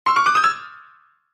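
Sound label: Piano Fast Comedy; keyboards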